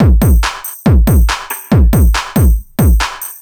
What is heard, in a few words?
hard beats 140, hard trance beats